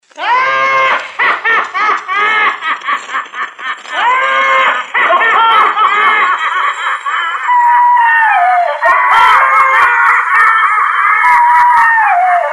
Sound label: laughing sailor; dash